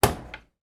washing machine door open; household; sound effects; sound effects one

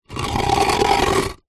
foley concrete block move drag hard ground; zapsplat

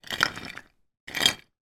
food biscuit jar custard creams tip x2; zapsplat

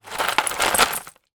broken glass tip from dustpan onto ground; zapsplat

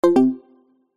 notification digital

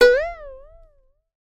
comedy spring twang pluck; sound effects one

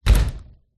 household cupboard door cheap thin close

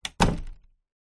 household door backdoor close; zapsplat

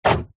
car hyundai trajet central locking internal; 2006; zapsplat

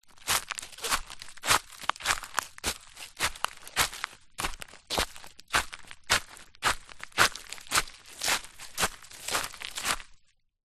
footsteps forest floor; foley; zapsplat

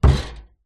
impacts wood thump with metal wire rattle

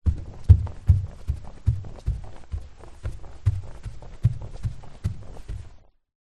footsteps carpet stairs up shorts; foley

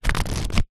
household book pages flick fast; zapsplat

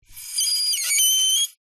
vibrations cello bow styrofoam cooler short; sound spark

